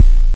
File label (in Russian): barabannij bass 808